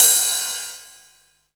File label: Crash, retro house samples, Drum Hits